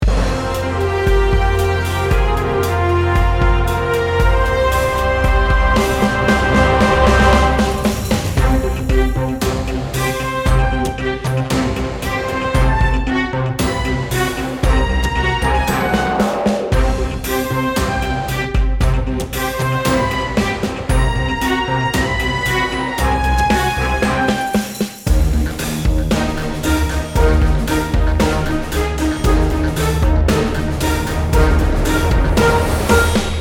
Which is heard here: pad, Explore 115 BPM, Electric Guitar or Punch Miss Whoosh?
Explore 115 BPM